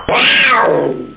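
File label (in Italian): giaguaro, jaguar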